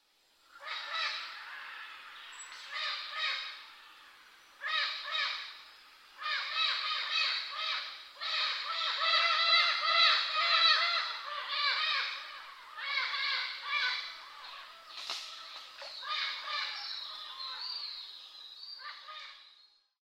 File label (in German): Papageien, Amazonas Regenwald; anavilhanas